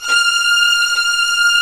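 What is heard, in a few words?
strings, Vlns 8 fff Agitato; Roland L-CD702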